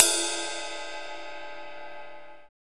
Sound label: RIDE2 D5; Greytsounds VOL-1; SDX HATS+CYM